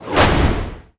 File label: Whoosh Impact